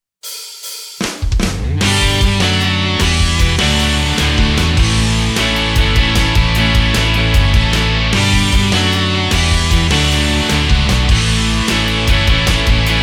Rock style, Korg Pa4X

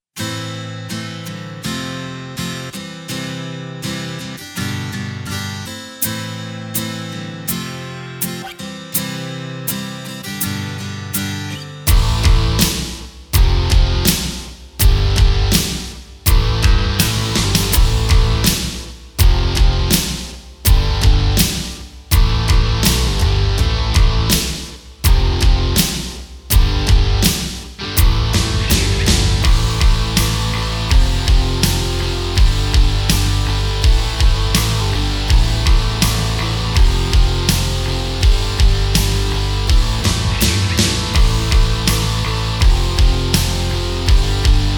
gun style

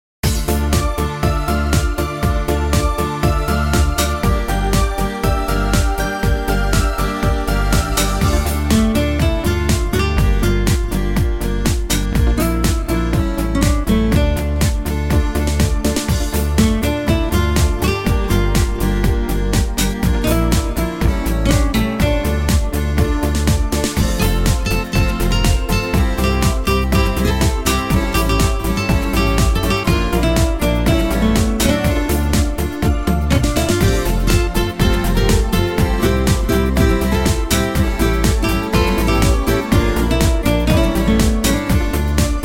disco fox

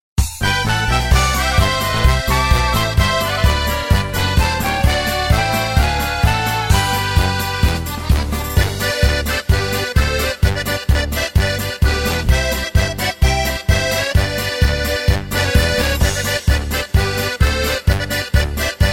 polka; oberkr.polka 2 demo

